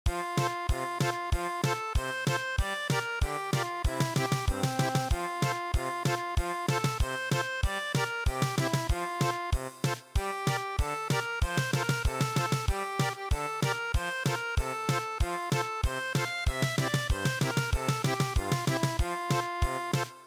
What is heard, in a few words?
German Polka; EARLY ONE MORNING